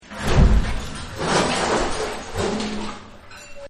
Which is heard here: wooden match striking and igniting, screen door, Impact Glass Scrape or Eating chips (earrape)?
Impact Glass Scrape